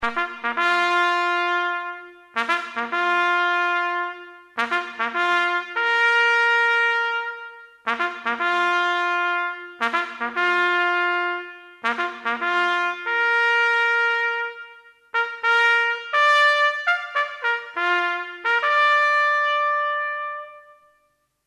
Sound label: Rouse, bugle